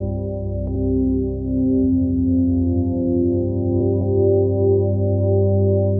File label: synth pad ambient 160 C; Sphere; eJay